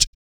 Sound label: Blues Soul; BOOGALOO; Spectrasonics; Vocal Planet